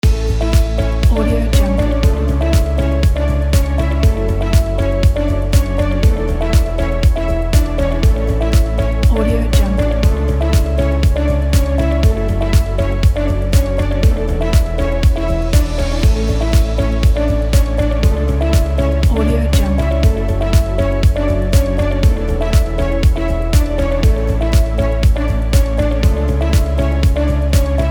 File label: corporate loop; duoflame